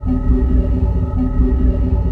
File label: BASS; PHANTASY STAR ONLINE Blue Burst